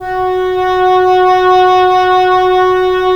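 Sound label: wind cor anglais; LA Composer vol. 3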